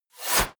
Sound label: snap